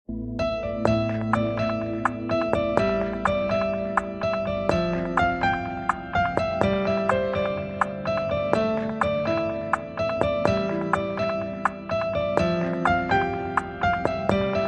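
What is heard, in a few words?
Ring Tone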